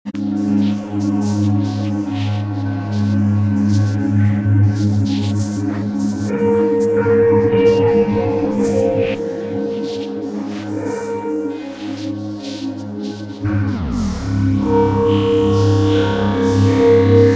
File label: Gentle Ambience